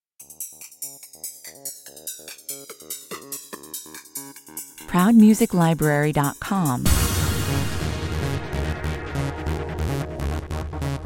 trance fighter 144 bpm, Schneller Rave mit Trance- und Ambient-Einflüssen, kurz und heftig; für die Ankündigung der Breaking News.

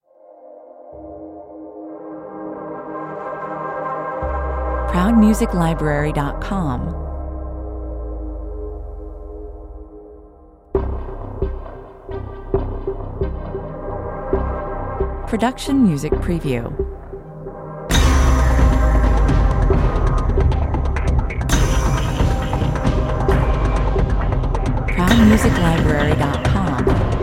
Schwebende Pads und geheimnisvolle Spannung; gepaart mit modernen cinematischen Bass Sequenzen, Hits und einem fulminantem Ende. Vielseitig einsetzba

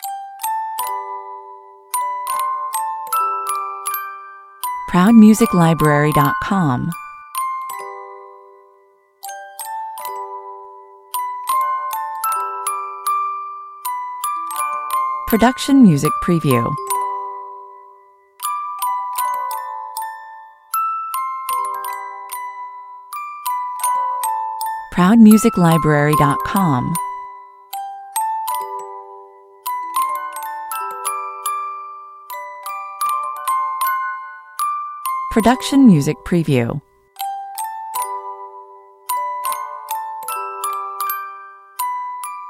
weisst du wieviel sternlein stehen music box 78bpm

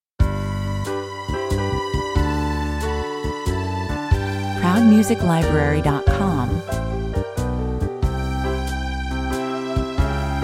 Die West Coast Gospel Church spielt Weihnachtslieder, 92 bpm; leise rieselt der schnee

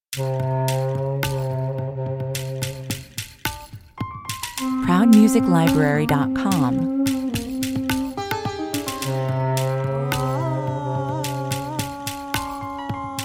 Middle East Sound mitsamt Instrumenten (Vocals, Flöte, Sitar und Trommeln), 106 bpm

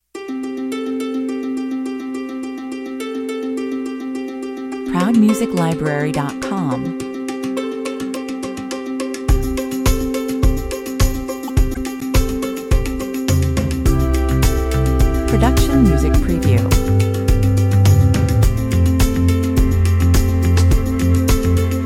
Positive Vibes, Organischer Track mit Harfe, Streichern und Glockenspiel, 105 bpm

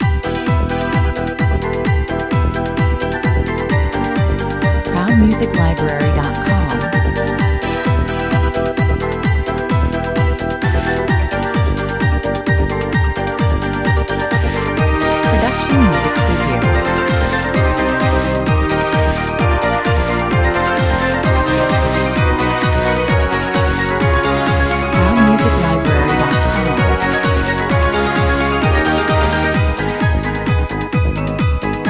crystal train 130 bpm, Sehr flottes und elegantes Grundthema, E-Combo mit orchestraler Unterstützung, immer in Bewegung; man hört die kleine Lokomotive förmlich atmen.

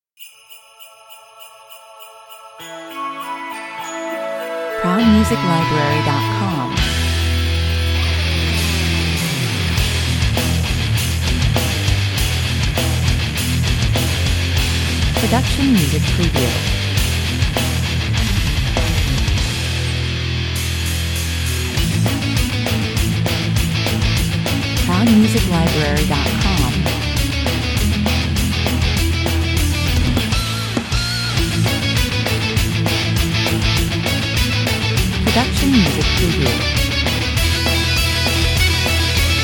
harte Metal-Version, 200 bpm

